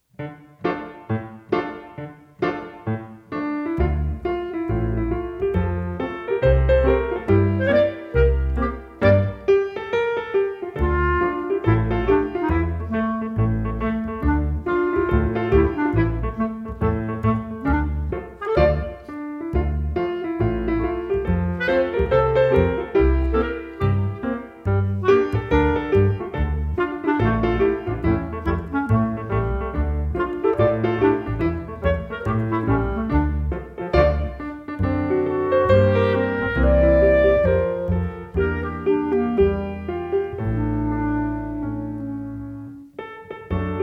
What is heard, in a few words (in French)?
le renard instrumental